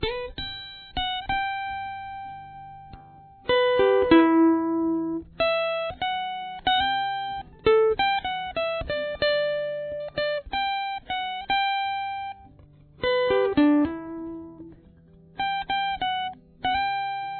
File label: lead, guitar